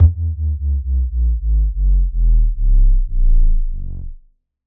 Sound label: Bass Sub Wub, Low End Elements; Glitchedtones; Bass Weight